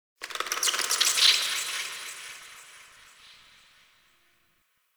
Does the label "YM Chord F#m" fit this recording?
No